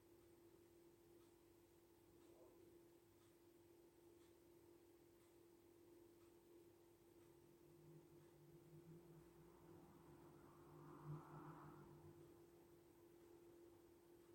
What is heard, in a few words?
fan noise